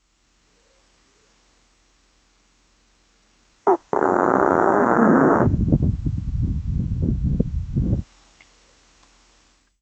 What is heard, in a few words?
farting as passing air, air release